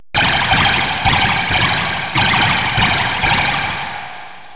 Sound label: shots; 8bit